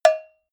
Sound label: cowbell hit muted hard